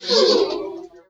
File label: Shroom Voice